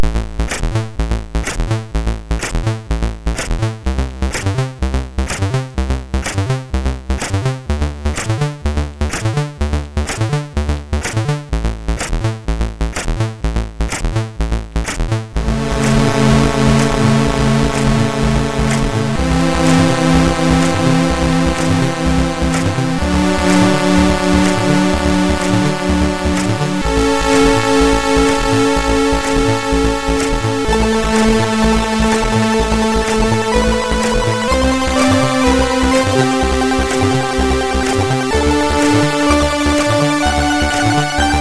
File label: War Cars Construction Kit, MOS6581R3